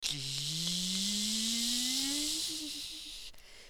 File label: Engine; Assemblee SFX